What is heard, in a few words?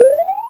Sound Bonus; arcade-canvas; ninja-runner